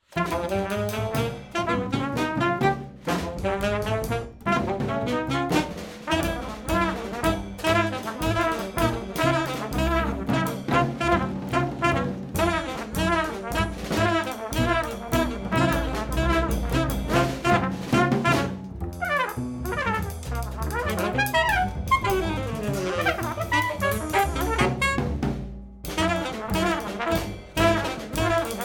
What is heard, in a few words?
house party starting